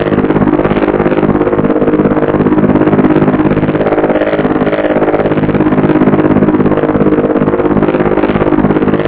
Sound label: manhack engine loop, npc; Garry's Mod; hl2